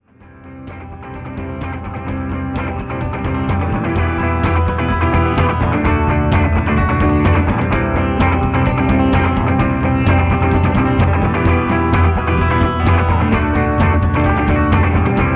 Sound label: instrumental